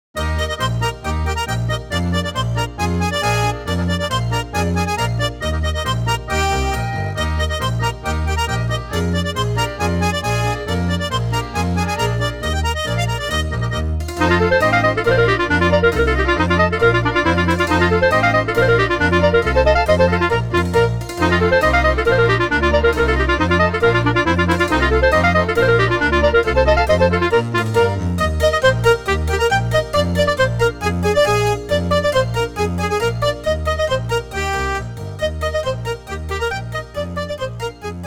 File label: alpine volksmusik swiss